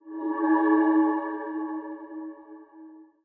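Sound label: Achievement Stylized Fx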